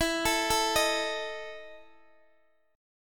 E Major 7th Suspended 4th E down Guitar DADGAD

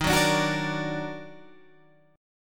Eb Minor Major 7th Eb down Guitar Open G, chord

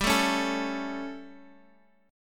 G Suspended 2nd Flat 5th; strummed; x,x,5,2,2,5

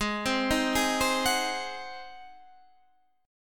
G sharp 7th; G#7; arpeggiated

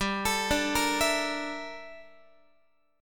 G Minor 6th Add 9th G down Guitar; Gm6add9; arpeggiated; x,15,12,12,14,15

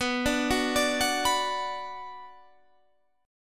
B Minor B

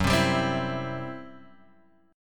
C Major F sharp

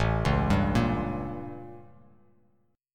A 7th Flat 9th; A7b9 arpeggiated; JGuitar

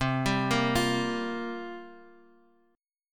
C 7th Suspended 4th

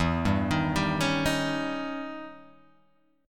E Minor Major 13th E Guitar Open G

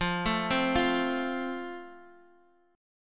F Major, Guitar Open D

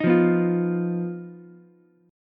B Major Flat 5th B Ukulele; BMb5; strummed; 8,5,7,8

